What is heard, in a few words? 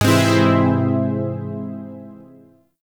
Shawzin Phrygian Chord I; Warframe; Zariman Shawzin; Chords